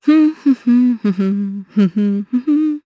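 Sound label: Vox Hum, Japanese; voices; heroes; Nicky; kingsraid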